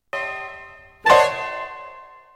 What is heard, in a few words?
FANFARES; Into The Woods; ACT 1